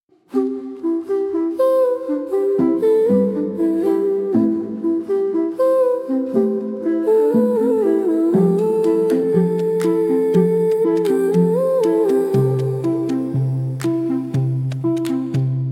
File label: Mellotron A-capella tape mix; Mellotron A-capella SPB tape mix 02